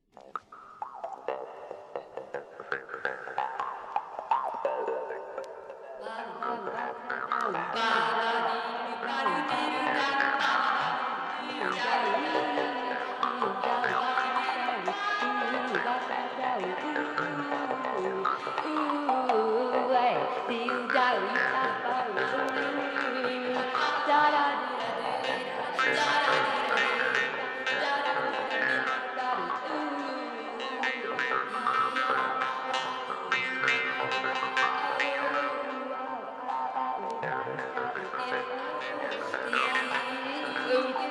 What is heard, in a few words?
Jews Harp Band